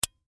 Flashlight Switch Off; Plastic; Foley; Electronics; Blastwave

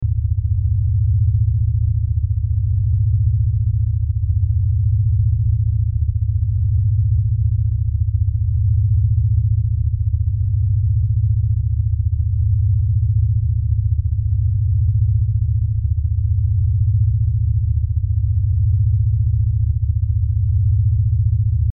Lfe Pulse Low Bass; Drone

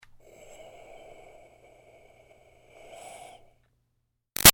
Steam Release Iron Breath Slow; Modulations; Miscellaneous Household; Sound Effects:Household; Big Room Sound

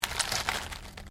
ORGANIC TWIST; Various Organic Foley sounds; Knives; Combat

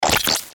Glitch Light Warble, Glitch Hiss Warped; Production Element Imaging Element Accent Transition; Blastwave

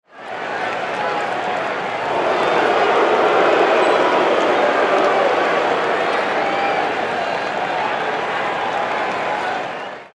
Crowd Boo Baseball, Game; Sports; Arena Sound Effects; BlastWave

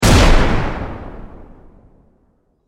Explosion Blast; Explosions

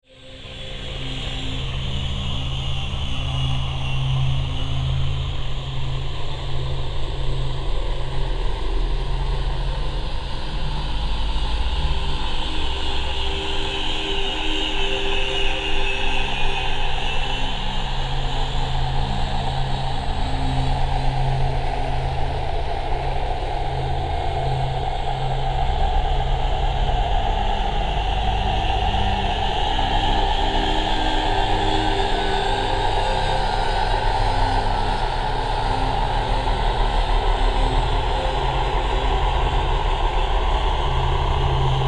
Synth, Stab, Drone, Horror, 5.1

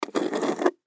Food Cat Food Can Pick Up Drag; Household; Foley; Food Sound Effects

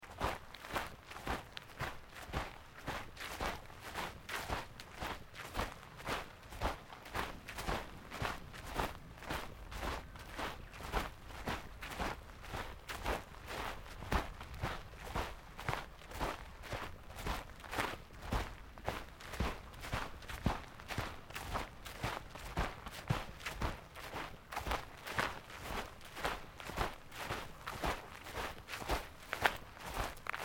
FOOTSTEPS SNEAKERS GRASS SEQUENCE; Footstep Foley; Footsteps (Sound Effects:Human)

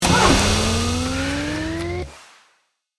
Burst Synth Ascend, Transition; Production Element, Imaging Element, Accent; Ascending Sound Effects; Blast Wave

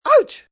Pain Ouch, Pain, male, short

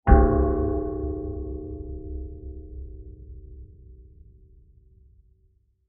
Deep Metal Impact; Blasts; Impacts; Crafted Signals

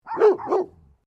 Animal Dogs 2 Labrador Poodle Barking; Various domestic dogs; Dogs (Sound Effects:Animals)

